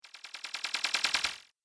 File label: The Subtle Liquid Pulse; Sci Fi Music; Andrew Fleming